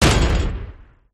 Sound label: pendulumturret firestop enemy; gow